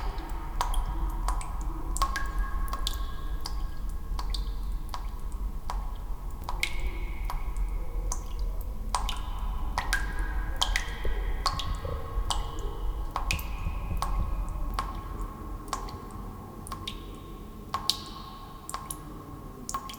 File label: cave drip; Environment; EK modern